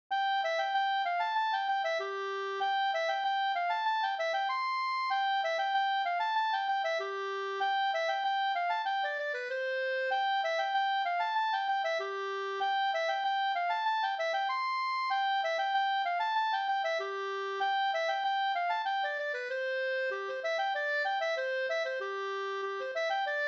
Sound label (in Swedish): halling; fr Osterdal no Nr1